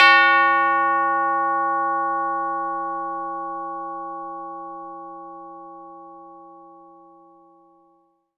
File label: CHIME D#3; ILIO